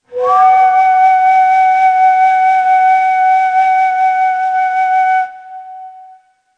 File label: Huseyni, Neysample